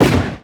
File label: Item Flash; FlyForInsanity